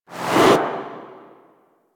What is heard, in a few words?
Enter Possession; Warframe; Gadgets